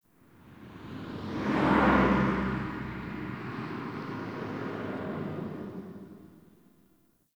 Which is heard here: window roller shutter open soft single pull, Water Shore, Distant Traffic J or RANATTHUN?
Distant Traffic J